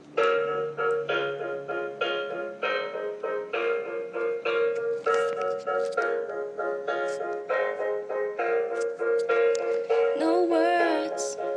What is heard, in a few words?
vocal